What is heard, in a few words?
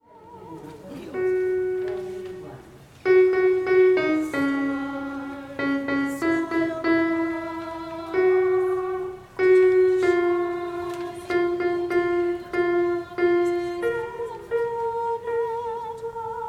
alto and then with soprano